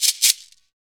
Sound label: SHAKER, Afro Shakers, Shake&Rattle